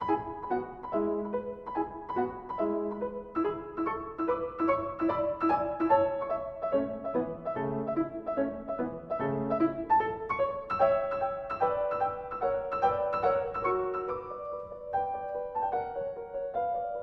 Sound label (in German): Sonate